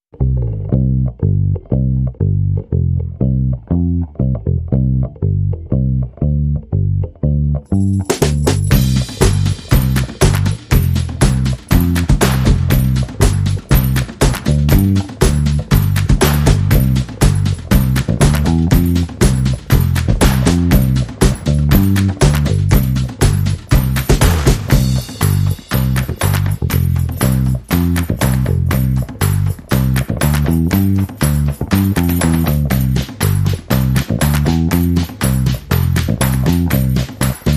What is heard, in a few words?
Rhythm and Bass Mix